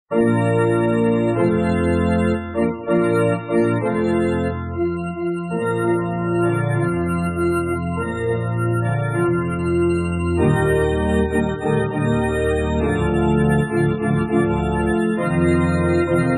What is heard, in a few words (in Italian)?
solo musica